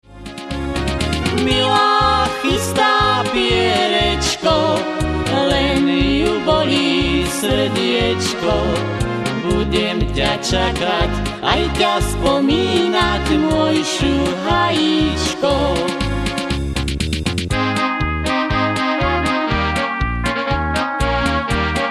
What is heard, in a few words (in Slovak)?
akord